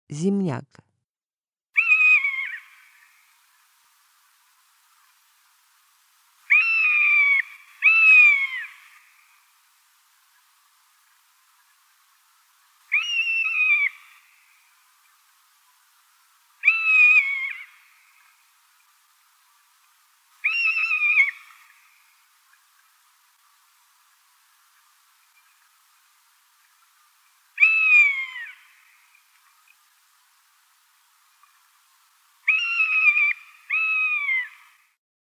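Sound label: zimnyak golos, rough-legged buzzard voice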